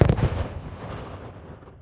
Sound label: city battle; Garry's Mod; hl2; streetwar